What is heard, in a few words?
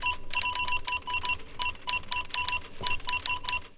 typewriter; tardis2010; garrysmod